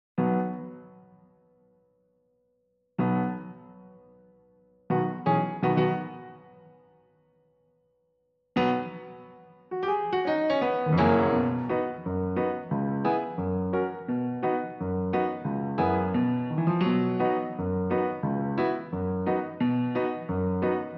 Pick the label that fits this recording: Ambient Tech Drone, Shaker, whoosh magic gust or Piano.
Piano